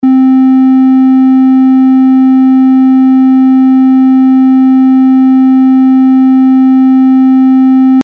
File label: triangle